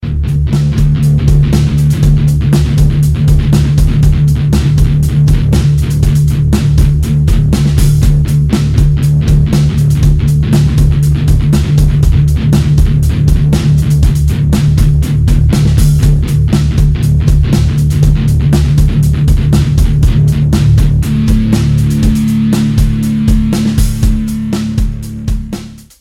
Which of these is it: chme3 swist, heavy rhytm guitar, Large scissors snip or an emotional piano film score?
heavy rhytm guitar